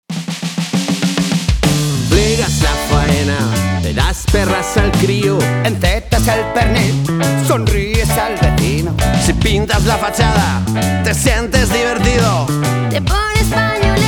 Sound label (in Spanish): REGGAE